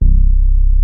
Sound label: Sub Sonic Bass C2; E-MU Formula 4000 Series Vol. 1; Hip Hop Nation